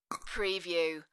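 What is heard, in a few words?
Pistachio Nut Bite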